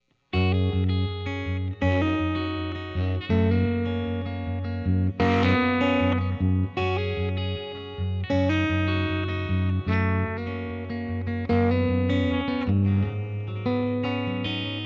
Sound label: Clean Bridge Am Std Tele, pickups